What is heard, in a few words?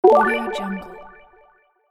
succes